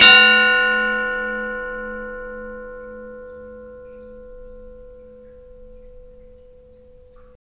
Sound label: church bell hit; jake